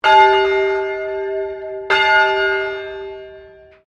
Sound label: Bell St Pauls Cathedral Melbourne Bell 2 Rings; The bells of St Pauls in Melbourne; Musical; SoundEffects-Foundation1